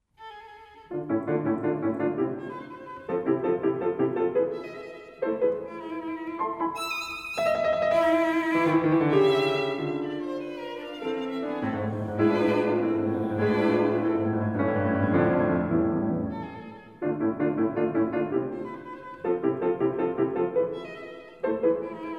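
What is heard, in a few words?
piano trio; onslow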